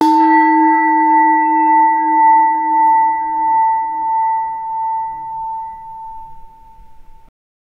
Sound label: distorted and aggressive